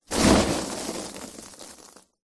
office foam packing peanuts in box hand in movement